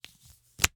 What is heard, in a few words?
Slap bracelet, smack, slap, whip, felt, plastic, unravel, release; Eiravaein Works; Vaeyan IV